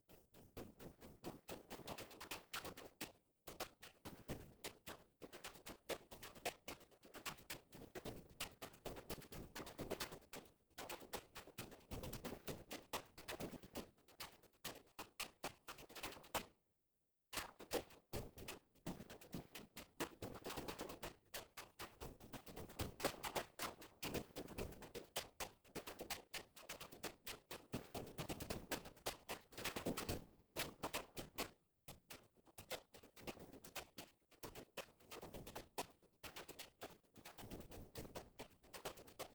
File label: CONGAS Residual Noise